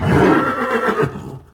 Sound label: attack, horse; combat; creatures